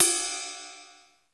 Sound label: R8 ride; Greytsounds VOL-1; TAILORED R8